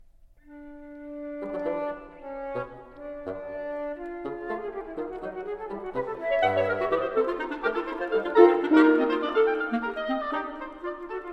Woodwind Quintet - Mvt. 2. Allegro Giocoso

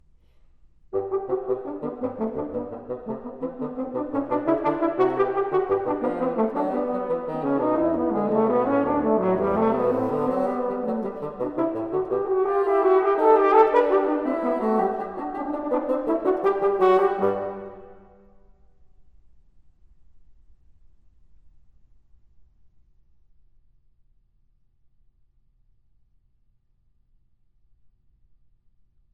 Duets For Horn & Bassoon; Twelve Duets For Horn & Bassoon - XI.

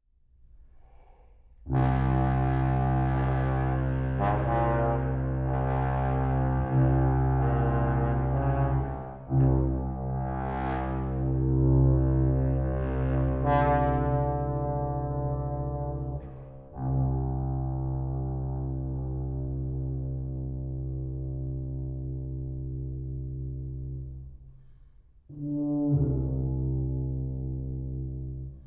Brass Quintet

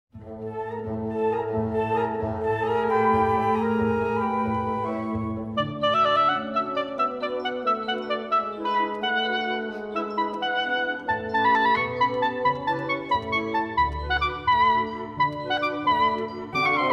Concerto for Oboe, Allegro non troppo

